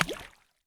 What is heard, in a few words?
Inf Weapons; Red Orchestra 41-45